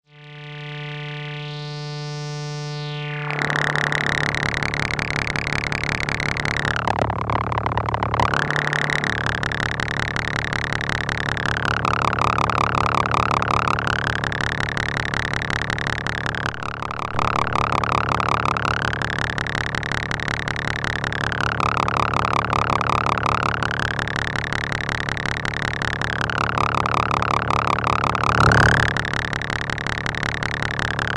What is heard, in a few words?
slow & OD; Parasite